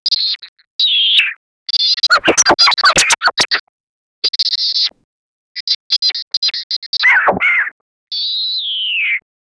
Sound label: a little robot sneezed an; humanoid